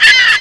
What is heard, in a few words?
Spider death; Curse of Dragor